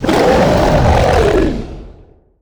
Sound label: Heat Creature Death Roar; Mars Sortie